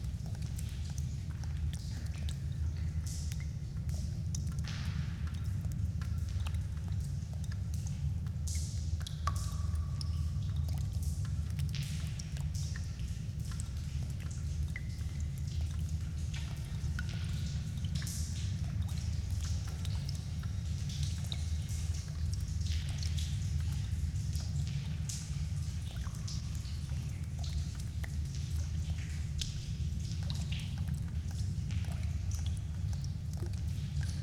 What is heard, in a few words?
cave; firefight